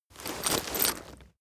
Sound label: leather metal handling long